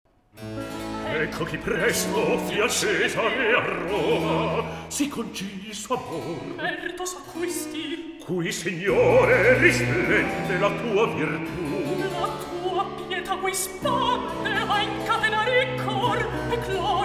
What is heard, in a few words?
Recitativo; Ecco Chi Presto Fia Cesare A Roma